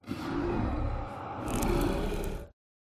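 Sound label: Dragon Skeleton stun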